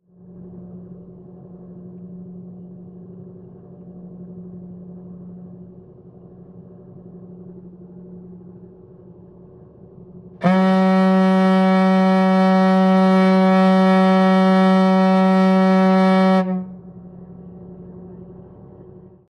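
BOATS & SHIPS HORN: interior: 1 long blast. Engine drone in background. Ferry boat.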